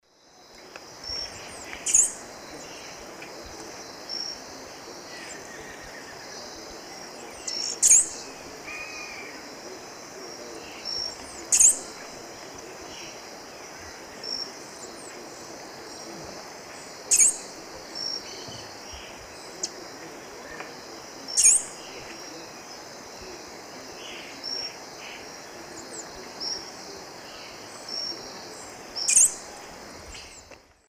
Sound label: Tersina viridis, calls male; S. America; Eastern Lowlands